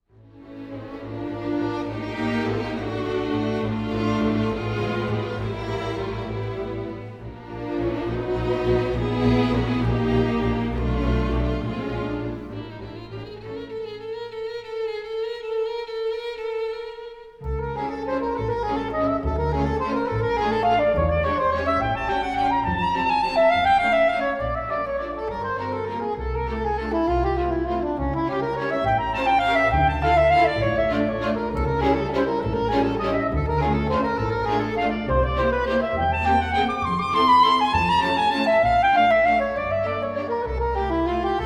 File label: Etude No. 14 in F Minor Op. 25 No. 2 arr. for saxophone